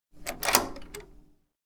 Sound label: door adult house unlock; aino; anemoi